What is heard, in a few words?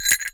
Cowbell Chain, Percussion